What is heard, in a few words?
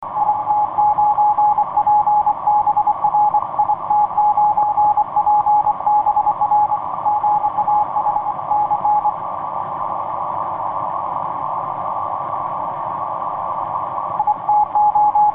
20m cw; fg-f6aus; it9pbr